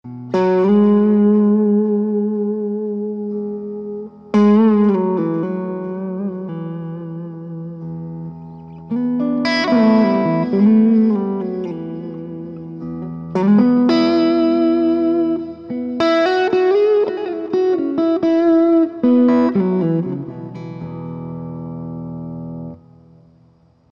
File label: neck clean, guitars